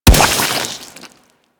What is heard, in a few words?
Grineer Chem Strike Nox Rifle Projectile Explode